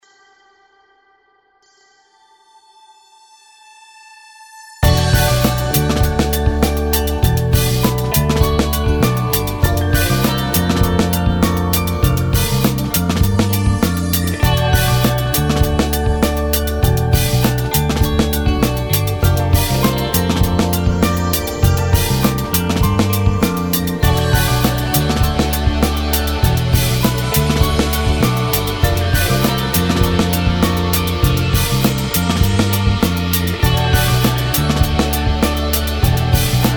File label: Acoustic silence vs AN1X